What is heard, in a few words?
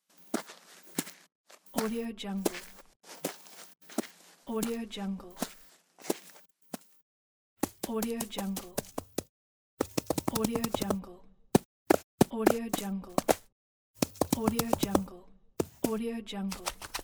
cartoon steps; volkovsound